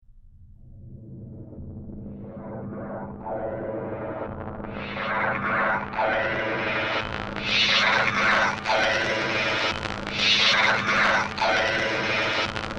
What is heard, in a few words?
a112 voice, wavetable; Doepfer